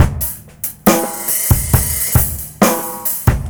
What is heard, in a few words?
BEAT, Roots Funk; USB Soundscan vol.41; AKAI